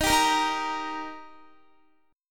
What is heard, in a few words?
D sharp Major D sharp Guitar, chord; D# strummed; x,18,17,15,15,17